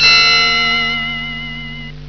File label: kling